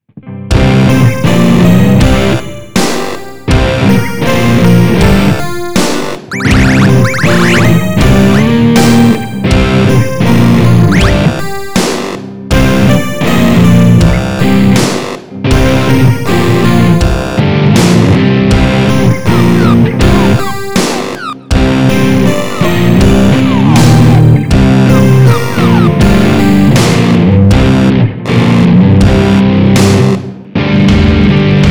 power socket; weeklybeats 2024

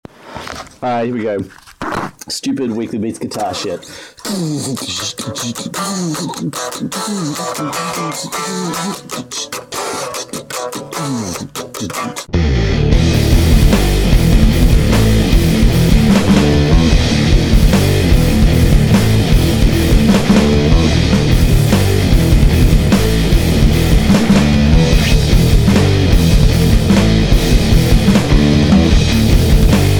guitar; weeklybeats-2016

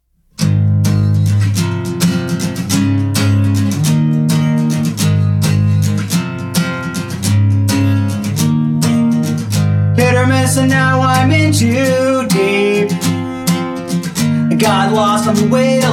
punk rock love song

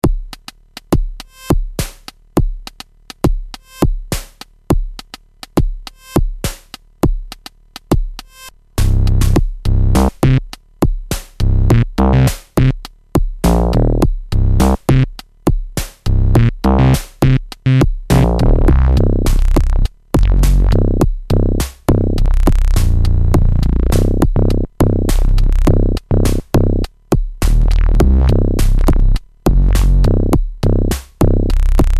fm bass monster; weeklybeats-2012

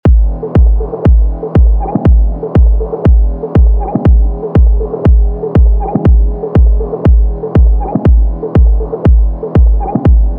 beat of the water drum; weeklybeats 2024

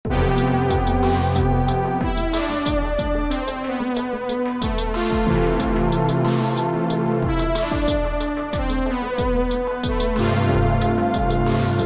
sad techno; weeklybeats 2026

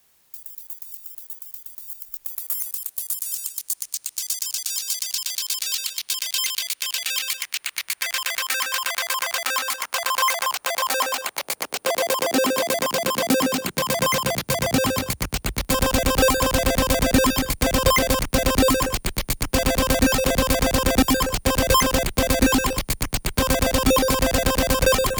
dj loops; weeklybeats-2020

